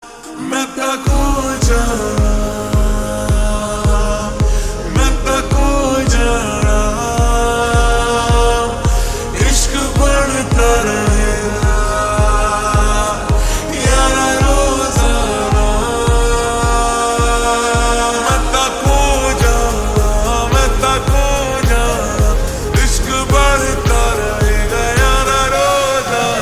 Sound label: Ringtone Slowed Reverb